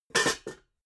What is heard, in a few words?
pots stack